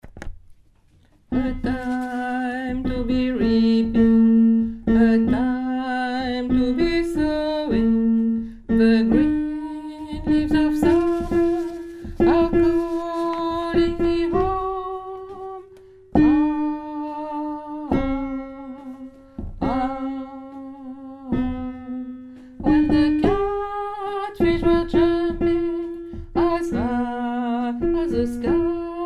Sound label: alto